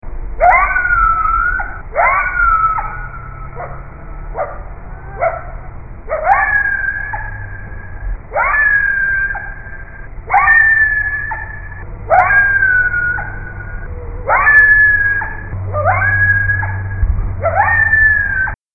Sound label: coyote